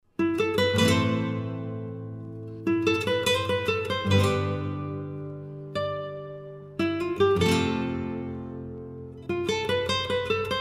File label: Amazing Chill Guitar; CHILL OUT SUAVE